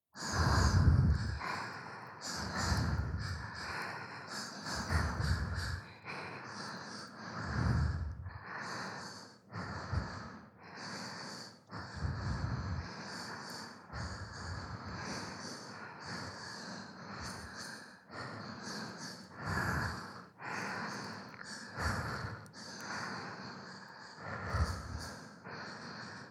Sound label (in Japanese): 四倍吐息ループ